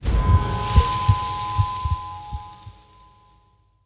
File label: unfect, zombie; mob; Minecraft; Votive World